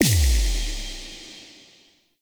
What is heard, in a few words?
Thorn Bow Arrow Impact Enemy; Weapon Impacts; Player; Bows; Envoy Fey Bow